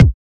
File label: Kicks